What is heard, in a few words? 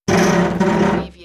Metal Stress - Pulling, Scraping Metal, Single